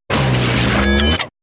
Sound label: rewind